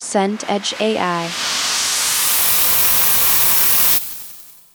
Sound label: whoosh bright long